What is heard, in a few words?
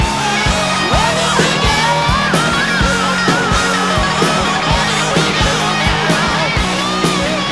big win loop